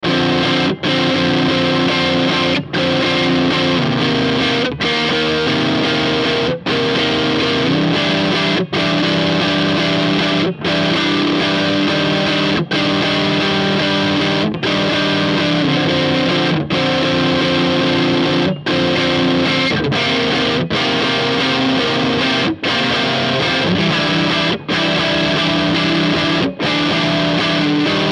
Ibanez RGD71AL Zerre Neck

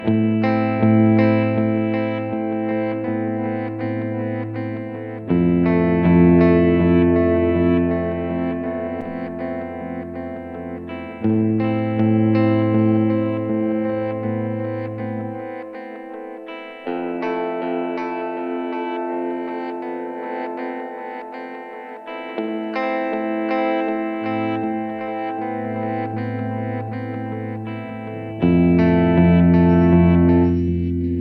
Golden Master Gitarre EQ Settings; Endorphines

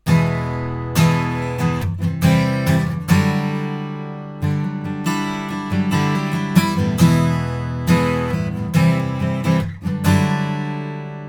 Blackstar Polar 2 Akustikgitarre ab 16 Sek Enhance Modus

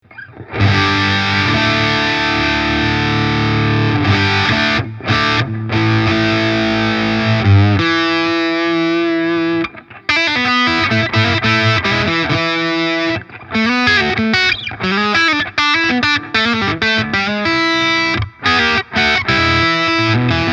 Verzerrt Steg erst HB dann SC

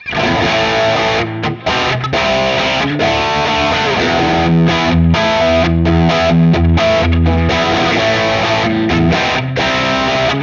Paul Reed Smith Plexi div. PU Kombinationen; Thomann 70th

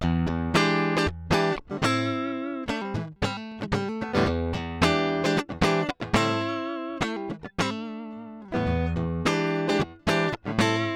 DI Aufnahme Treble Booster aus DI Signal

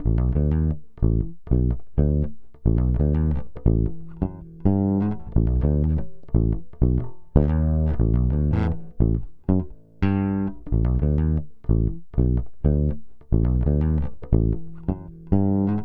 Kompressor Vergleich SSL Native Comp V6

